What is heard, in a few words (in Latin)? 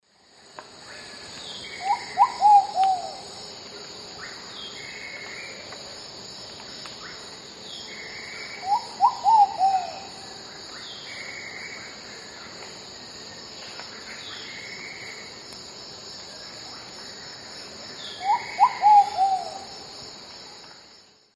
Patagioenas subvinacea, song; S. America; Eastern Lowlands